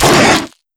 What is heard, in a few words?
Grunt Hit Light; netent